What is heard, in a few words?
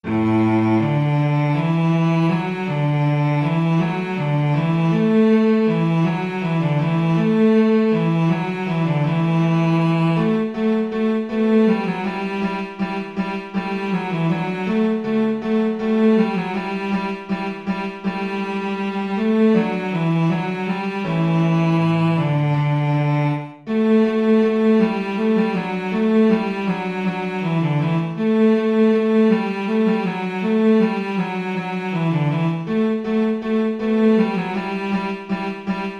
cello